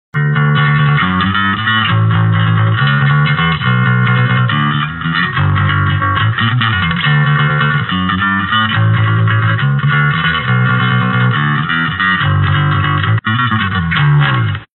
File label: Bass Guitar